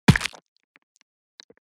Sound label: BOW hits